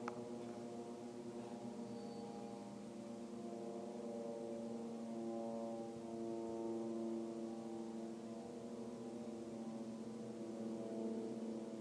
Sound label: loudest buzzing, gaza